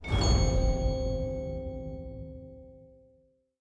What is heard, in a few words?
Challenge Success